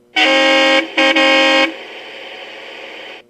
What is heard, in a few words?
airhorn